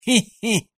risa compromiso Laugh commitment

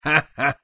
risa compromiso Laugh of commitment

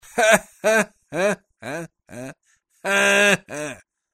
risa forzada Forced laugh

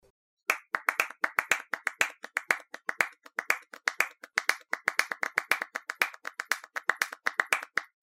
rhythm loop claps palmas 120 bpm

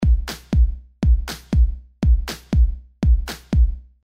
rhythm loop palm kik rithm; Sound-Graph

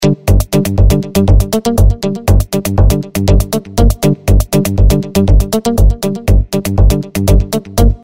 rhythm loop funk dance

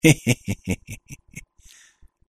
risa traidor treacherous laugh

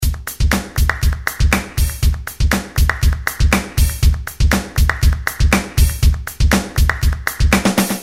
rhythm Gipsy Rock; Sound Graph

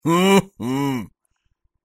risa incredula incredulous laugh

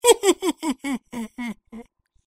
risa traidora laugh treacherous

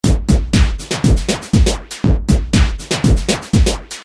rhythm loop Elastic flanger; Sound Graph

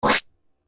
Line selecction; Sound Graph

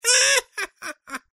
risa burlona mocking laugh

